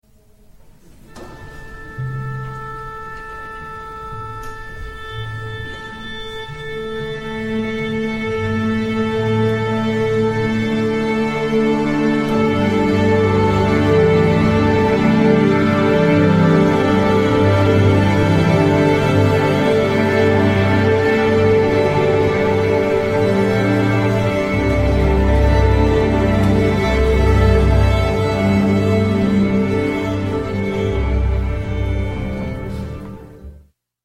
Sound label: orchestra; Eleanore's Magical World; Music & Sounds